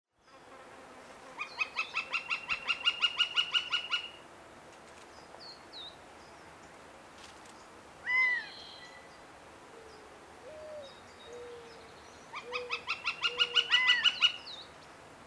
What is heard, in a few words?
Gilded Flicker